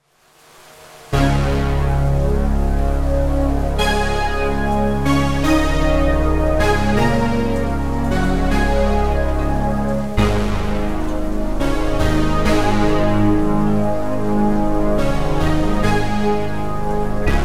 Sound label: dramasynth, horror synth; monstrous horror synth; by super synths